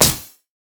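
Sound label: basic attack on hit; LoL; anivia; cryophoenix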